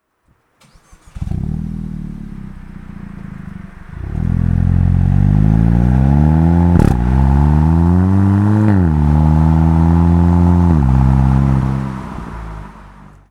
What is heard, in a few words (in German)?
sportage motor an 0-60; bastuck